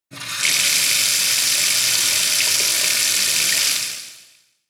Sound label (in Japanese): jyaguchi